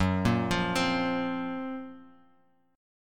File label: F sharp Major Sharp 11th, Bass Standard; 14,13,15,17; arpeggiated